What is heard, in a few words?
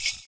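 PERC, percussions; Univers Sons - Raricussions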